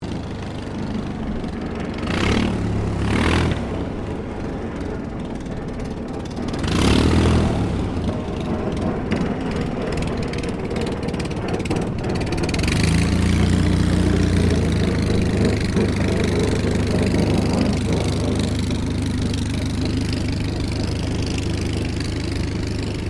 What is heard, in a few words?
Aircraft Dehavilland Tigermoth Taxi Shutdown, RAAF Tigermoth biplane training aircraft